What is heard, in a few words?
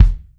Kick Neosoul